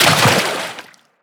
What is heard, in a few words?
Splash, Water